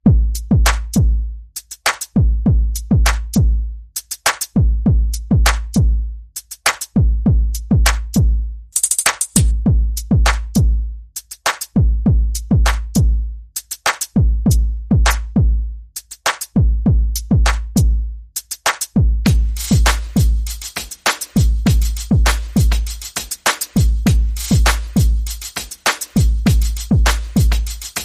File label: Perc and FX Only